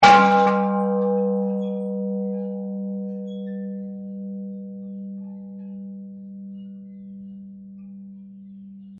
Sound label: Buddhist Bell; Musical; Buddhist Bell 1; Bells